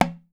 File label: ITOLELE, BATA; Voice Crystal - Steve Reid Definitive Percussion